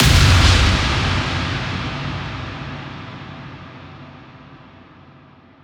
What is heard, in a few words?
128BPM Kit; Shockwave(PIL) - Future Big Room vol 2; Play It Loud Kit